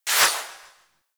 Tail; Warframe; Infested; Cernos

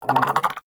Armadillo Vocal Idle; Warframe; Characters; Hunting Critters

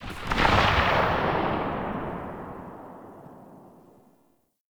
Wrist Gun Tail; Weapons; Warframe; Tenno

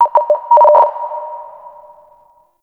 Interior Orbital Station Telemetry Mid

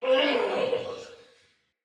Death, Barks Battle Soldier; Sinecure Soldier

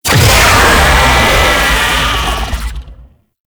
Infested Vocal Player Spotted; Warframe

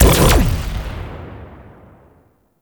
Corpus Arachnoid Camper Leg Electrical Burst; Warframe

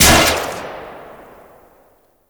Mixdown Shot; Ember Prime Boss; Warframes

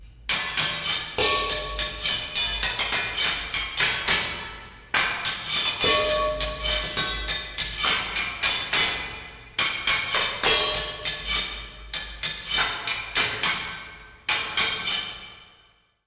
Music Exterior Song; Vent Kids; Skeg

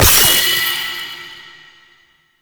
Horse Racing Orb Hit; Warframe; Duviri

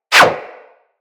Bullet Parry Metal; Impacts; Bullet Parries

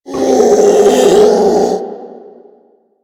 Infested Ancient Strike Scream; Enemies; Warframe

